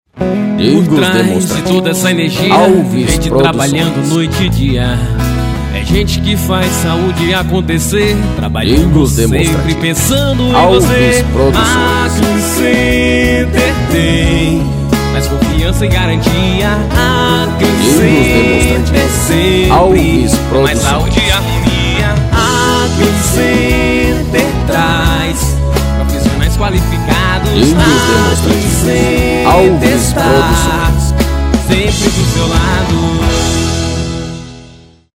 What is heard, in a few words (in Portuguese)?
jingles; alves producoes; clincenter